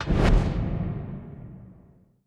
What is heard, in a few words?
spin Button Click; godsoffortune